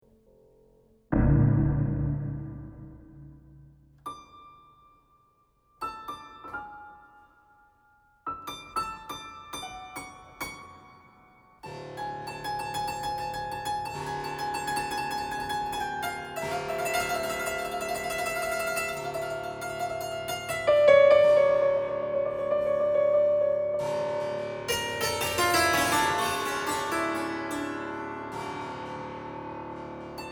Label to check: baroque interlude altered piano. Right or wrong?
Right